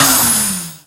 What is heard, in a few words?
turbo shift, engine sound